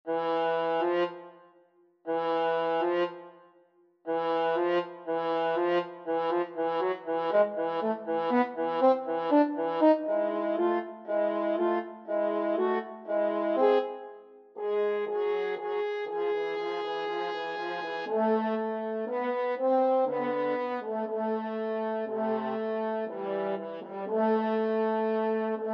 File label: french horn duet; dvorak